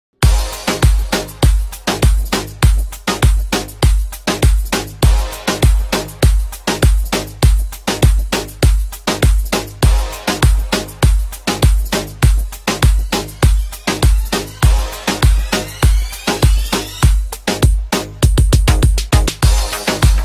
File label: Arabian Music Ringtone